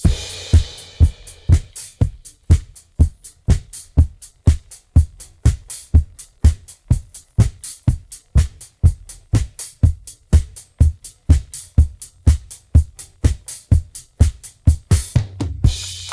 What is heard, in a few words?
pattern 124bpm, drums